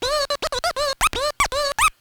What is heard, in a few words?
FX SCRAT, Scratchin; Club-50 - Foundations Roland; Scratch 1 S